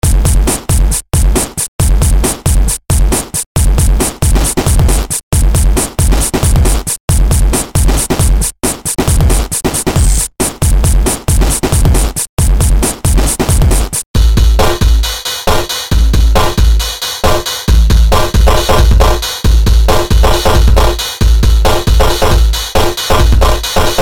Corrupt Amen

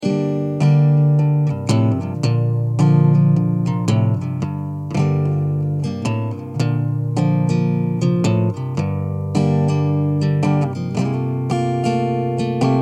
Gm 110 Acoustic Song Chords

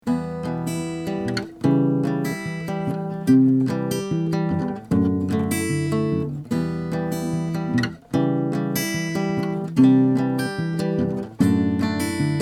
guitar

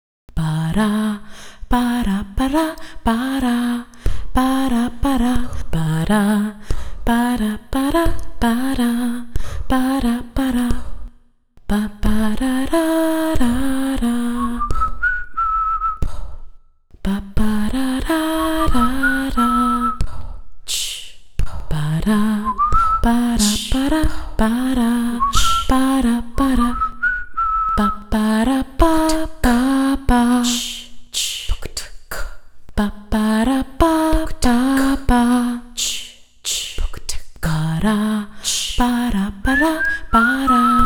Vocals

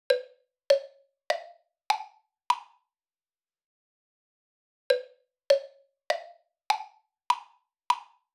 Cowbells

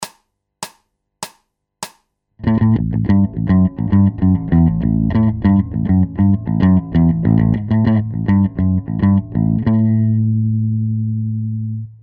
Bass Loops

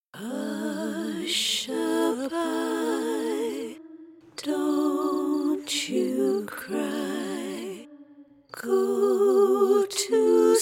A Capella

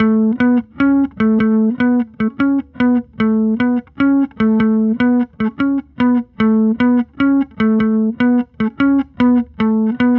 bass

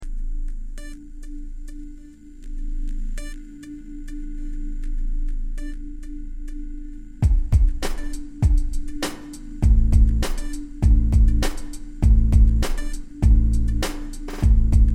Am100 Groove; Recommencer

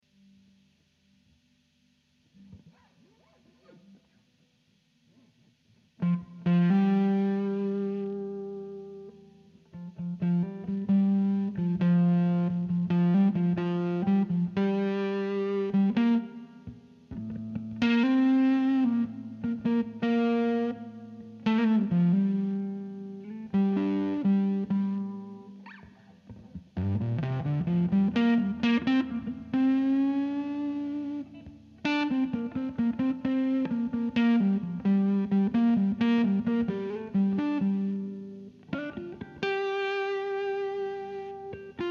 Gm130 Elec GTR doodling

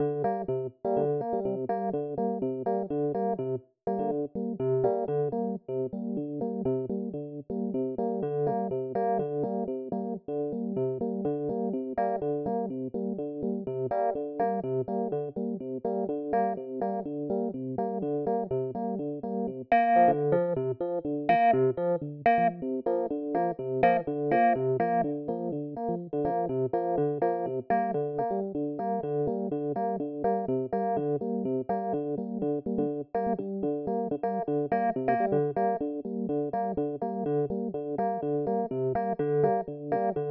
epiano FM; FM Time

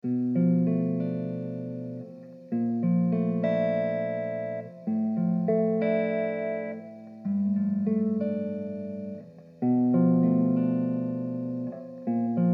Dm100 Jazzy GTR chords

